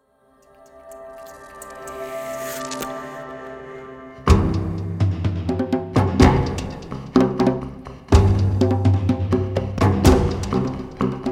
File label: TRIBAL TRANCE TEASER